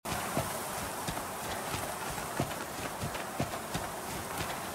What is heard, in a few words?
footsteps; The Flame in the Flood